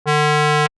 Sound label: instrument fast